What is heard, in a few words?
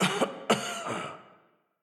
Prisoner Cough; Prison Architect; DLC REFORM